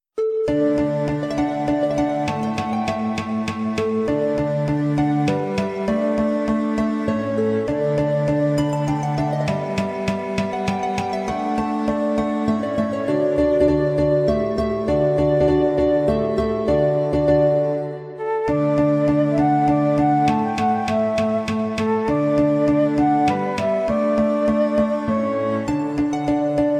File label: Anthem Celtic